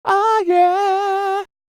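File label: falsetto, R&B Dance; Spectrasonics Vocal Planet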